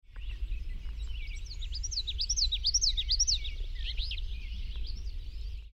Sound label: Common Yellowthroat